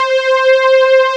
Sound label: UHF STRG; USB Soundscan vol.49; Analog Fantasies; AKAI